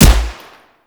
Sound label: Grakata Shot, Weapons; Warframe; Void Trader